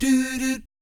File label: "DOO DOOT", vocal jazz fx